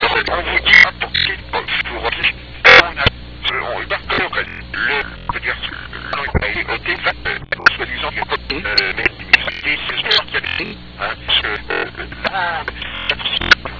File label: tavern; france